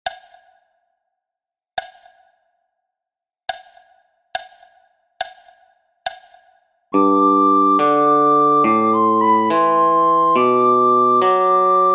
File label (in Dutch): sopraan; Blokfluitles in Utrecht